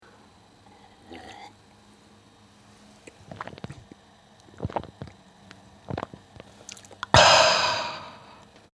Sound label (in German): trinken